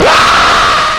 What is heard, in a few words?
scream